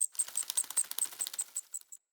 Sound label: Day Insect Close